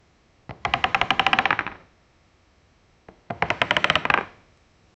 a single chess piece

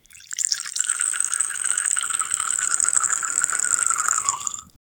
continuesly high blood

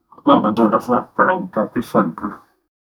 human action

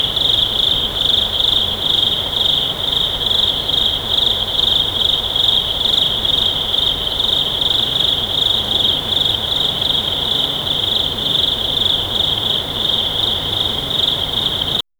night ambience

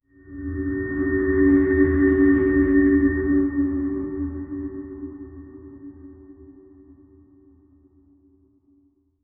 start with a powerful, magical

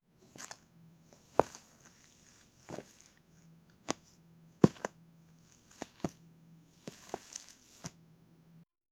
a quick close up sound ef, footwear

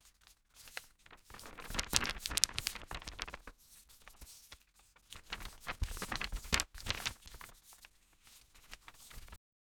the slow rustling of thin

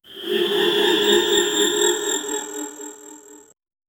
ghosty pick up; ghostly

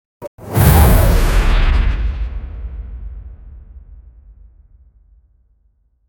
bwaaap comic explosion

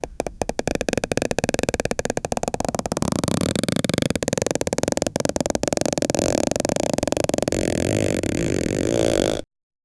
bowstring tension sound; bow and arrow

